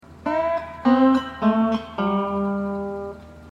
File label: hawaiian piece